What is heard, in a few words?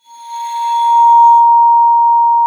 ARCO A#5, VIBES; Xsample VOL-4